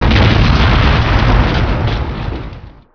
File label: EXPLOSE; Hidden & Dangerous; h&d demo